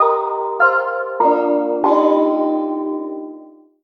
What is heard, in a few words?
bgm arena start; monster hunter 4 ultimate